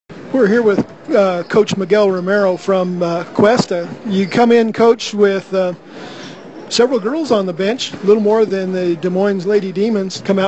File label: Cowbell; Questa Girls